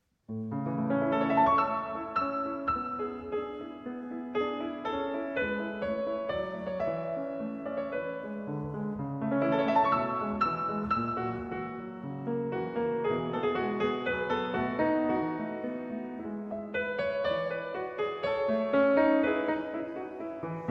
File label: Piano Sonata No. 2 in A Major - Rondo – Grazioso